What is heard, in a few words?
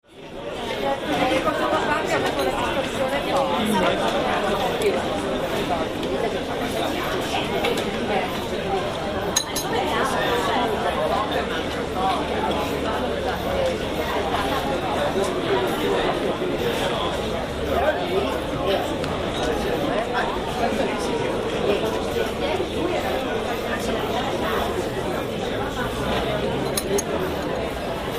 Cafe Desenzano